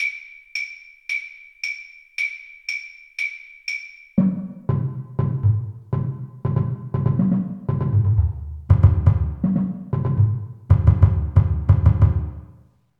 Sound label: Bass Drum Auditions Play along